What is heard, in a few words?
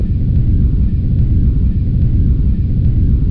cargo ship engine sound